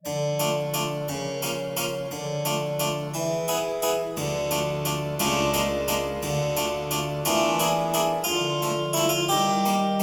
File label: Opera Harpsichord; World of Warcraft; The Burning Crusade